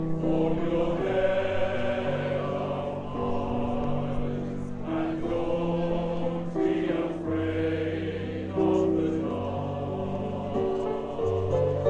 victorian christmas fayre male voice choir ambiance; worcester male voice choir; fairground